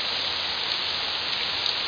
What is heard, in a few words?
rain